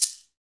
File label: Shakers